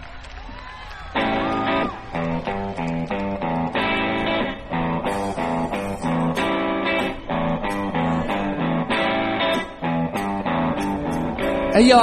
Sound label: glove jazz